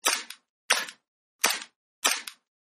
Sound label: Airsoft M1911, Airsoft M1911 Colt Handgun Dry Fire; Airsoft M1911 Colt Handgun Dry Fire-4 Takes; Toy Guns; Toys